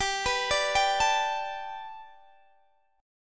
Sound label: G Major Add 9th Guitar DADGAD, chord